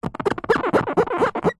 office whiteboard rub fingers 2 squeak fast

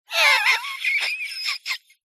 cartoon voice high pitched laugh wheezy

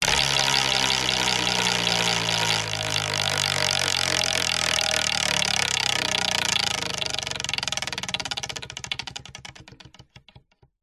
leisure wheel of fortune wooden spin fast

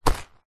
leather handbag or pouch grab

